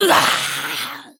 female chess piece, Death

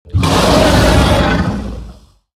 Chia Drake Attack

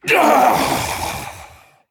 Male Flamewaker Death

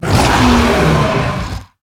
Devilsaur Attack

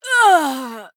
Female Night Elf Death

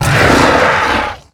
ravasaur

